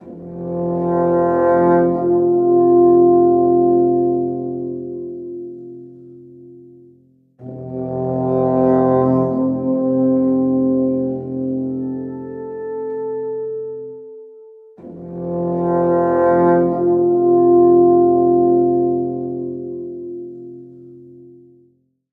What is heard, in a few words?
morning air, google pixel alarm sesleri